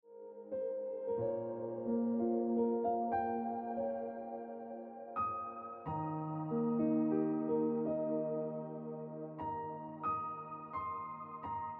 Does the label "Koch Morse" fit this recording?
No